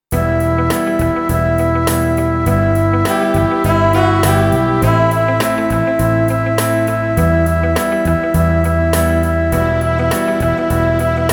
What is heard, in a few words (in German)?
Pop Ballad Querflöte, in Em, 102 bpm